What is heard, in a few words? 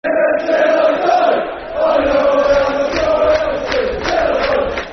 You Are My Swindon, Football Chant (Soccer Songs); Swindon Town Stfc